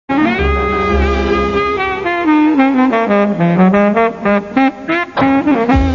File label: solo